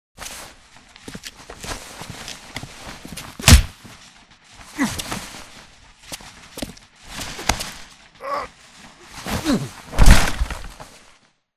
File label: brawl; Titanfall 2